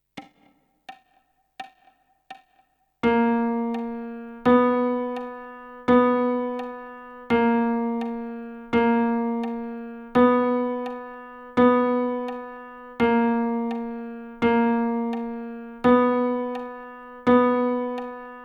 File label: Playalong Chromatic Trainer E Flute full range, bansuri; playtalong tracks to train the chromatic notes on the bansuri